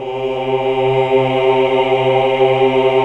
AAH, Hallelujah

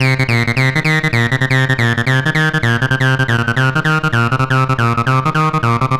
Acid Loops, 160; Dance Synths; Hardcore Loops

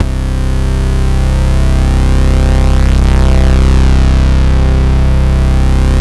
ACID CUT BASS; Masterbits; Vintage Voltage

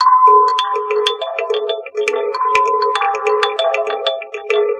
Mbira, Acoustic Beats; Sonic Foundry (Sony Creative Software); Myths of Technology

